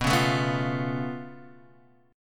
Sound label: Db Augmented 7th B